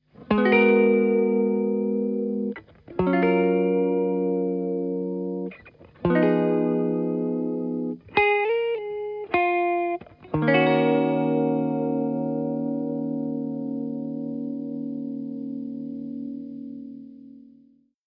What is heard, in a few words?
Shaker and Comp